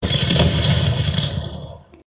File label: nitro fist uppercut; fortnite